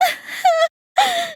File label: cry; tsumugi